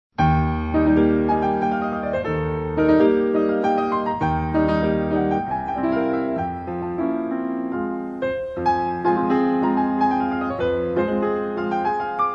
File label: Pianist Grand Polonaise Brillante in E flat major